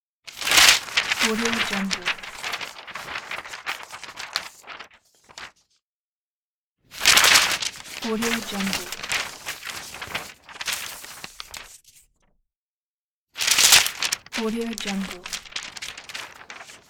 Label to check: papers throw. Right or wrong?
Right